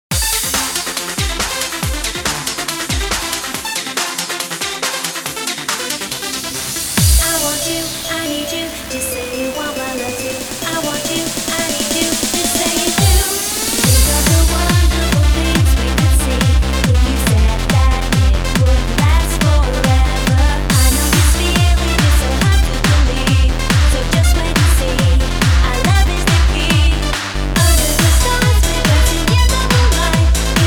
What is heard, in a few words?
The Beat; Dance Dance Revolution Hottest Party